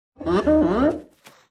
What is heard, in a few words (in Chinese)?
开心声; 嗅探兽